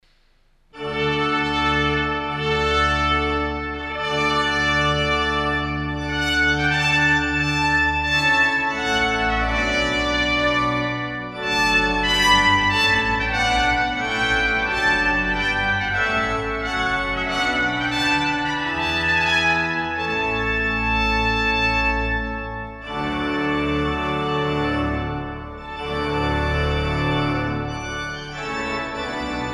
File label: march; g.f.handel; suita d-dur